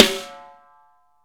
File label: snare dry; Real Mega Drums